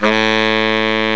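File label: BARITONE SAX, REEDS; CMI Sound Library Rev 1.3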